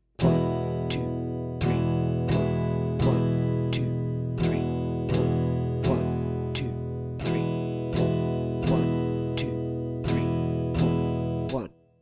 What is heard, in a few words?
2 chord ex 3, guitar